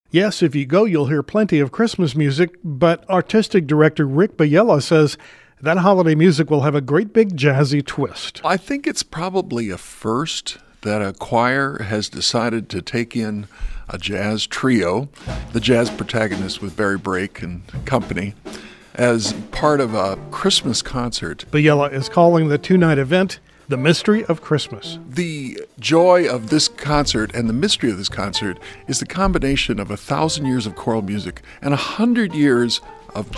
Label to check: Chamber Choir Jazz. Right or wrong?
Right